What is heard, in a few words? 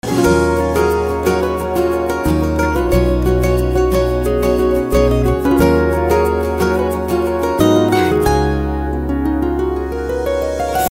Romantic Love Tone